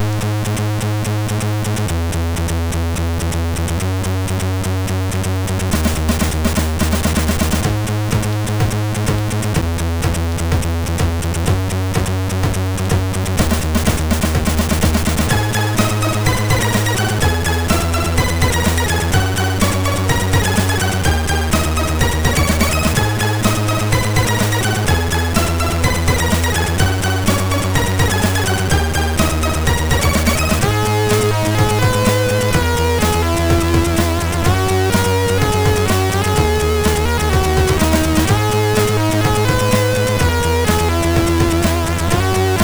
Robot Area; MOS6581R3

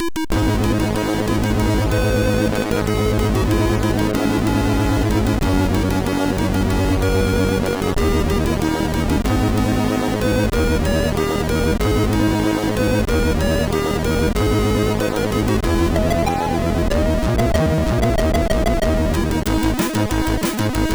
No Limits tune, MOS6581R3

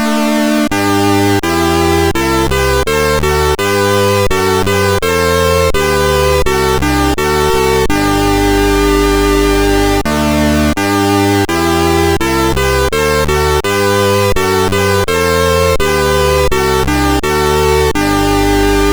Dutch Anthem, MOS6581R2; Wijnhoven Joachim

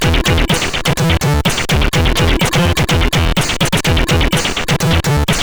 Test, CSG8580; hvsc

